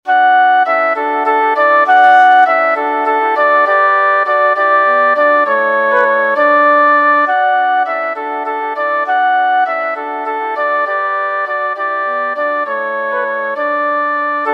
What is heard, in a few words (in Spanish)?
Unidos en el canto VOZ